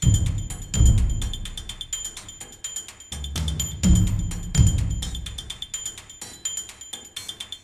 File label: danger funny; Identity V